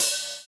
Open Hhat, Hihat; Equipped Music; Loungin House

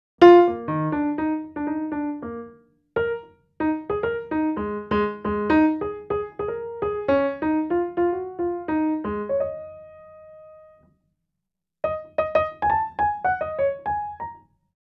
Piano Solo, Cinematic Funk; Sony Creative Software; Urban Tone Poems; Master Zorn

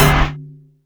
slippery

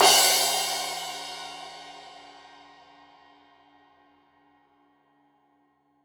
Crash 15in L edge, Cymbals Stereo

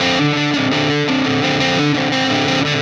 Static FX Guitar

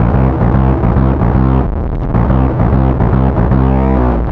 Bass, Distorted C; Bass X

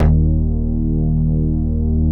PUNCH BASS

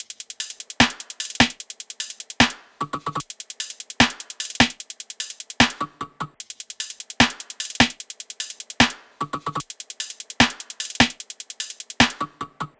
loop top 150; Seismic Loops

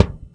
Pound, Kicks; Airwindows

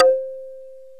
BOTL MARIMBA; OMI - Universe of Sounds

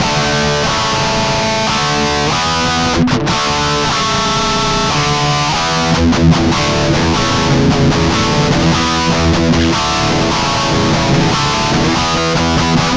Guitar Licks

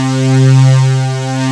SYN WIDE, Wavestation; Sound & Vision - Gigapack I CD 2

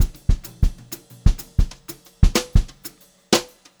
Brushed Drum Loops jazzy 126; USB Soundscan vol.39; AKAI; 90 s Sample CDs

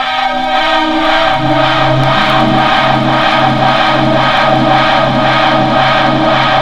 FX GTR, GUITARS KIT

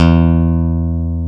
E 2, PICK BASS; AKAI S-Series CD-ROM Sound Library VOL-7

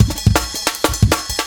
Dynamic 162, breaks

